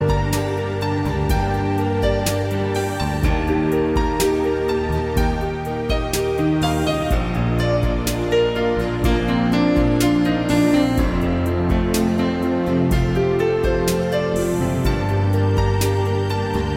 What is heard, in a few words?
Bandari